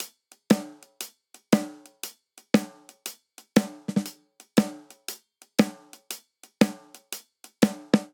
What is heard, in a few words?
Blues Shuffle Snare & Hihat 118 bpm; 4 4; MIDI Clips; Ableton; Factory Packs; Drum Booth